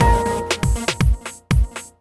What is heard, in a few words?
Big Bell; SKY IM-A800S-VEGA-LTE; messagenotify